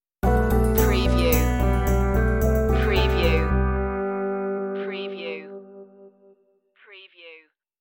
footprints in the snow stinger, Pop Ballad / Soft Pop